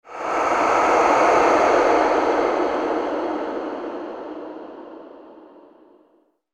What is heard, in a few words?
doom breath high; swooshes-swishes